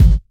good bass drum sample A key, steel kick drum sample tuned to the A note; kick drum samples